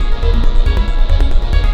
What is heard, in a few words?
atmos, techno, 138, c#; Techno ID; atmo+fx-loops